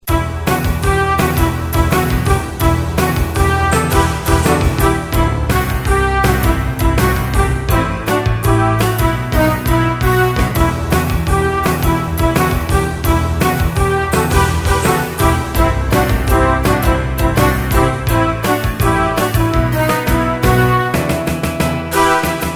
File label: music